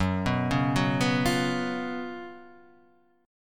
F sharp Major 7th Suspended 4th

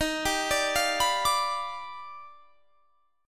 D sharp Augmented Major 9th D sharp, chord; arpeggiated; 23,22,24,22,24,22; JGuitar

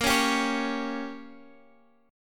A sharp Minor 6th, Guitar, Drop D

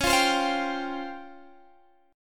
C sharp Major Sharp 11th, Guitar Open G

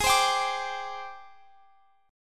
Ab Major Sharp 11th Ab down Guitar Open F; AbM#11 strummed

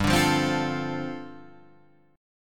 G 9th Suspended 4th, Guitar, Standard; 3,5,3,5,6,5; strummed; capo=3

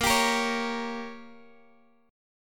Bb Minor 6th, Guitar, Open F; Bbm6 strummed

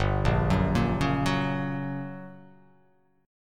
G Minor Major 7th Flat 5th, G, down

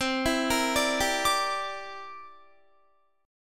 C 9th, Guitar; arpeggiated; 20,19,20,19,19,21; 4E,4A,5D,5G,5C,6F

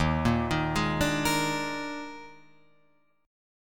Eb Major 7th Suspended 4th Eb, Guitar, Open F; EbM7sus4 arpeggiated

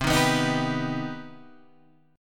C Minor Major 7th C Guitar Open G

